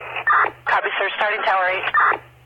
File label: wounded; Ready Or Not